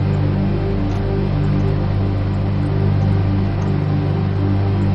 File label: loop drone g, drums